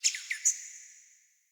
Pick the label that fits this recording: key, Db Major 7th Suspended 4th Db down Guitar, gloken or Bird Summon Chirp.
Bird Summon Chirp